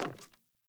Sandals Walk Wood; Characters; Player

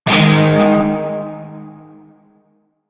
Shawzin Chromatic Chord F; Warframe; Narmer Shawzin

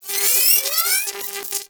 Reload AoE Charge, Pistol; Weapons; Grineer; Amphis

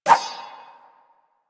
Rapier Whoosh; Swords

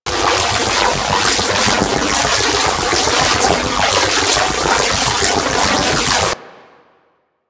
Beam Loop; Warframe; Captain Vor

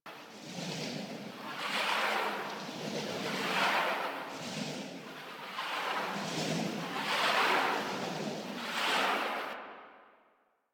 Fire Loop; Phoenix; Warframe; DE; Vehicles; RailJack; RailJackPowers

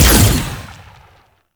Fire Shot

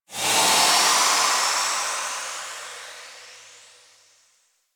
Town Steam Release; Ambience; Duviri; Warframe

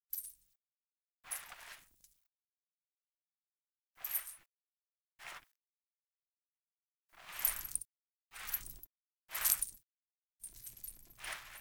Foley; Friendly; Verminia; Bromius Quest

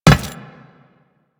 Run Metal, Footsteps; Warframe; Teshin

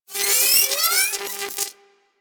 Reload AoE Charge, Pistol; Weapons; Grineer; Amphis

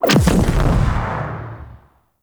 Rocket Explosion; Jelly Rocket; Warframe; Railjack; Grineer; Space Fighter Dredger Agent

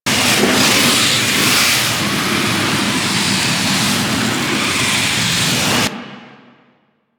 Knee Slide Loop Default

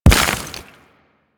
Step Surface Dirt, Mech; Step Surfaces; Nechro

